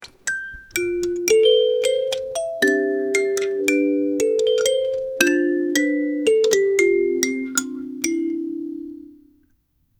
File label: a cheerful short melody